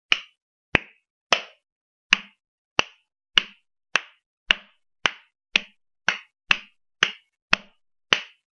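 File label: slap sound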